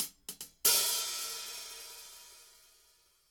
Band - Hit Hi Hats; Ed Edd n Eddy; Adromulus Pack

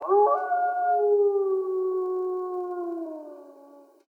Wolf Howl; Ed Edd n Eddy; Adromulus Pack; EEnE

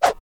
Whoosh; Ed Edd n Eddy; Adromulus Pack; EEnE

